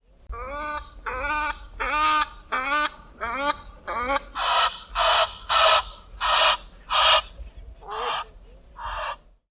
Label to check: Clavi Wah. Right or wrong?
Wrong